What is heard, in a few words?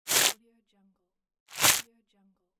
trash delete